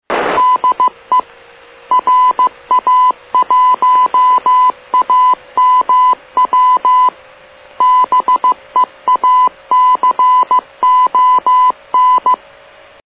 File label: beacon